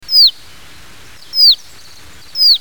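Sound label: russet sparrow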